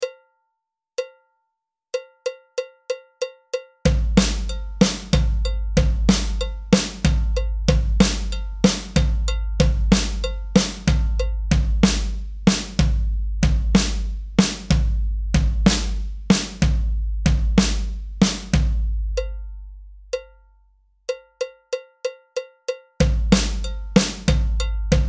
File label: Toms 63 bpm